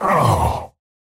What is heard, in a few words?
pain big; Slork